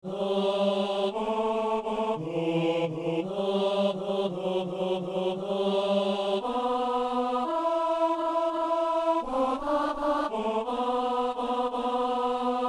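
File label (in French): ténor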